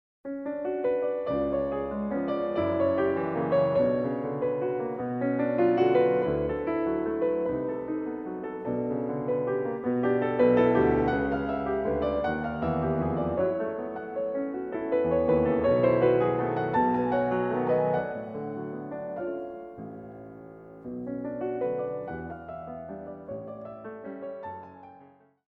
Venezuelan Waltzes

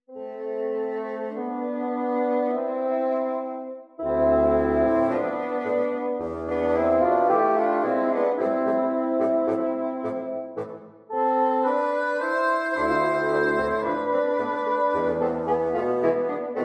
Tango Habanera

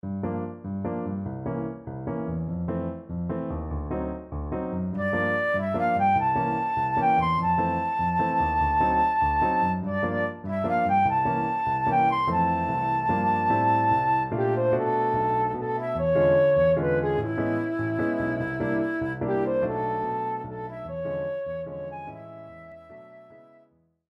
Waltz

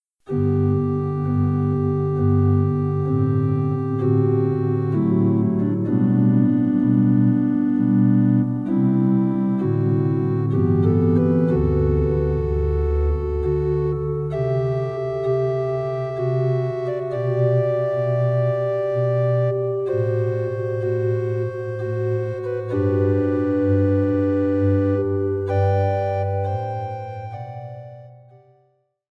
Largo; Handel

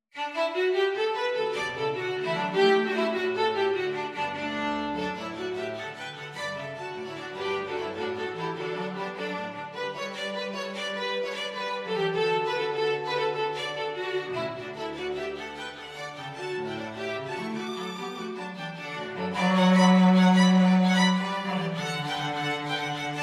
Excerpt from Jig, The Fiddlers Jig

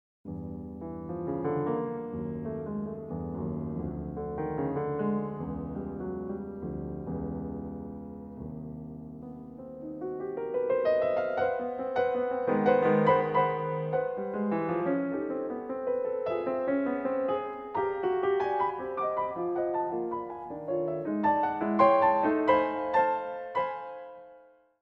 Nocturne, Piano

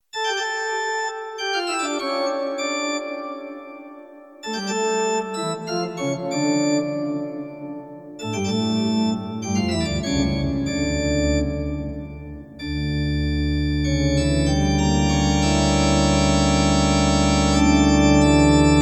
Toccata and Fugue; Bach

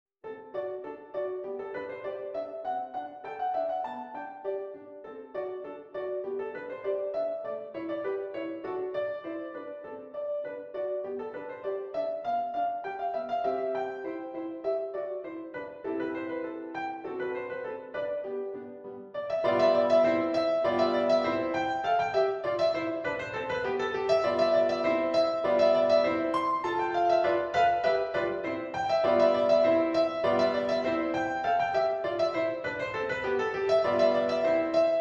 Polka

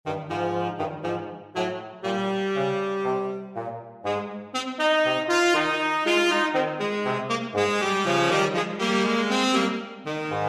Ragtime

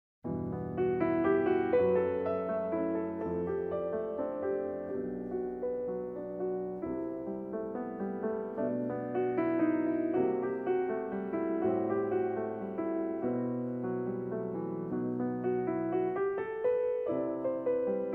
Venezuelan Waltzes; Carmen Rosa

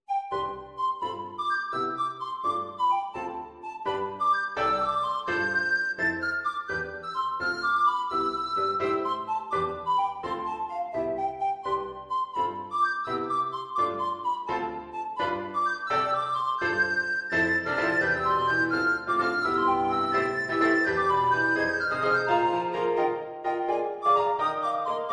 Wallaby Hop, Descant Recorder and Piano

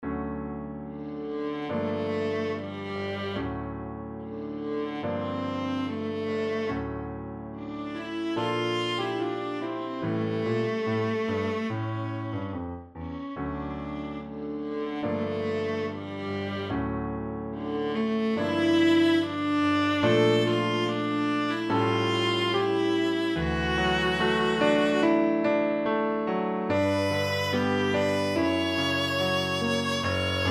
Viola Piano

